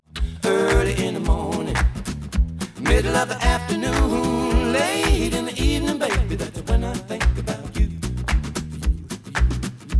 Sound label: noised; 4bit